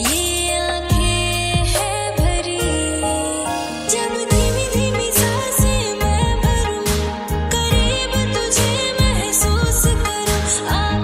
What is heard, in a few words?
music ringtone, bollywood